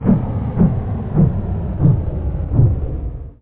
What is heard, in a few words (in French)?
ventil